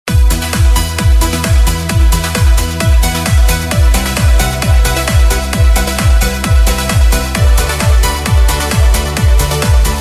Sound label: PROGRESSIVE TRANCE; SUMMER VOL 1